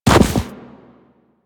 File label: Run Deep Snow, Footsteps; Warframe; Operator